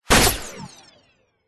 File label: science fiction gunshot with electronic beeping tail; zapsplat